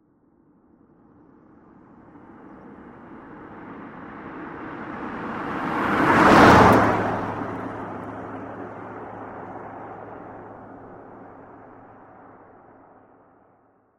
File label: Ford Custom Passes; SOUND EFFECTS1